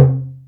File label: WOODTOM; Best Service Advanced Media Trax Modern Composer